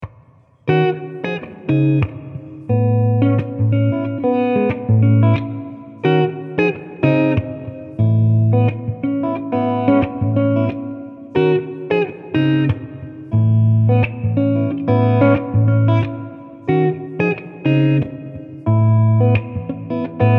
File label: Gtr Plate